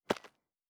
Dirt Walk Surface, Footsteps; Survival Game Sounds - Lite Edition

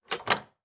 Door Open; Survival Game Sounds - Lite Edition

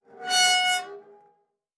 Rusty Metal Creak, Doors; Survival Game Sounds - Lite Edition

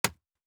Wood Chop; Survival Game Sounds - Lite Edition; Crafting

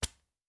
Arrow Shot

